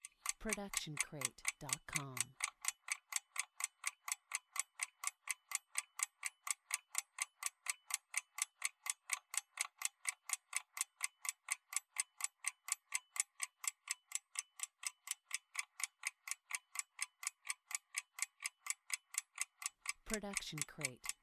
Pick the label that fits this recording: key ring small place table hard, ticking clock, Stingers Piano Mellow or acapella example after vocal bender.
ticking clock